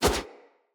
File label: Split Sword Whoosh Fast; Weapons; Swords; Starter Split Sword